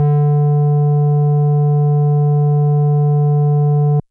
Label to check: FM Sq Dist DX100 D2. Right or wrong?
Right